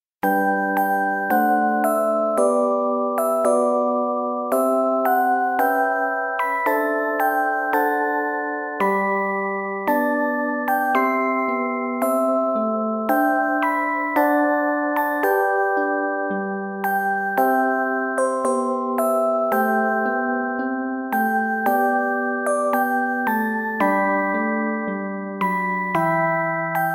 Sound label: chime; tsuruoka